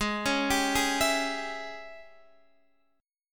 Ab Major 7th Suspended 4th Sharp 5th, Guitar, DADGAD